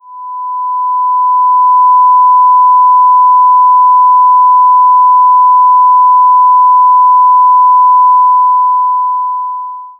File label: 1kHz sine